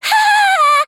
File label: laugh